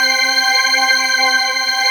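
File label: CHOIR ORGAN C6; Q Up Arts; Dream Experience; DANCE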